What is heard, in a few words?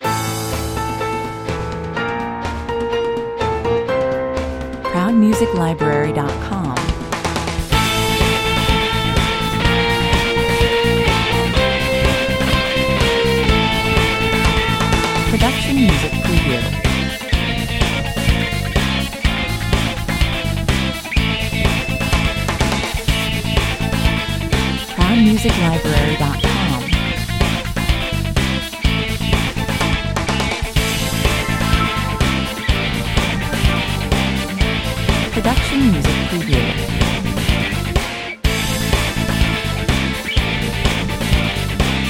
Fast paced, inspiring, epic and modern Rock track, 125 bpm